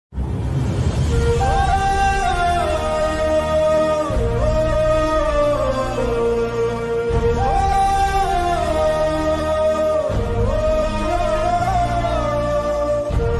emotional ringtone south indian sad bgm disco bgm ringtone; jilla; vijay mohanlal